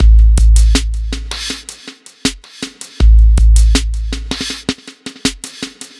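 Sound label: Guit Solo, соло; ambient vol 2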